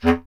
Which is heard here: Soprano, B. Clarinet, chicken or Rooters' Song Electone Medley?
B. Clarinet